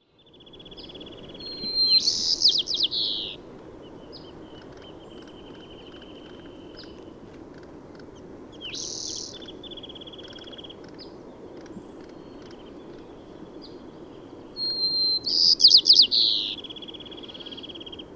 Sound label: White-crowned Sparrow